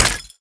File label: weapon flashbang impact; physics; weapons